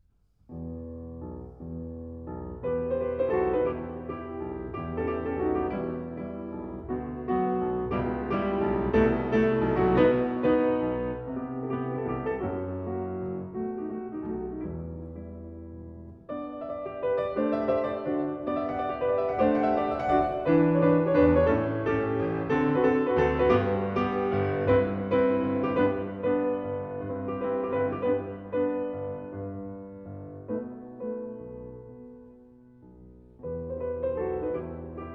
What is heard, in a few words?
Folk-Song, Piano; Lyric Pieces, Op. 38; Grieg